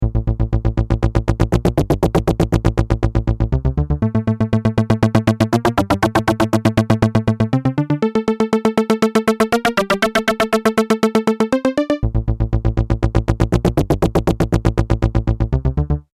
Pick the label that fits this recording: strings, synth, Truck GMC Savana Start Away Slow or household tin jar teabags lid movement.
synth